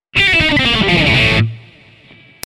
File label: Brit lead